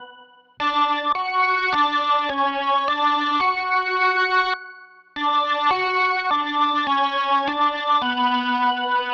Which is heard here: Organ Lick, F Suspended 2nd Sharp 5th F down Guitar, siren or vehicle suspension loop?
Organ Lick